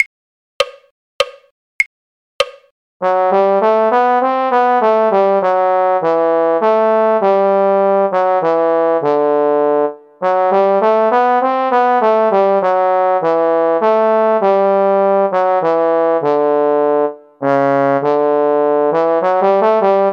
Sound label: trombone; carols